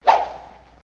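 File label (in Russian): взмах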